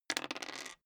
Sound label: dice roll table clatter